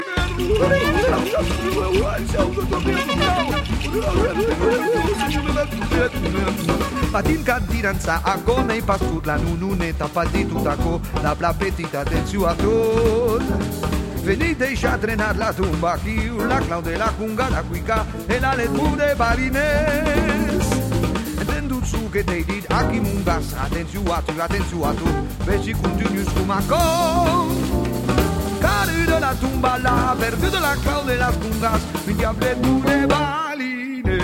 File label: canto